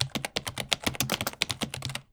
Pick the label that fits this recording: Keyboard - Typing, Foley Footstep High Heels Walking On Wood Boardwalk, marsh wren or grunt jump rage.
Keyboard - Typing